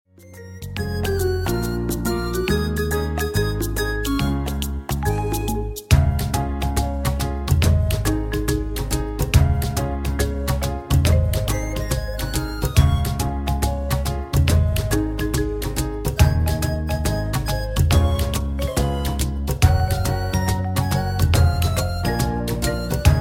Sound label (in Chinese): Karaoke, 正向心理兒歌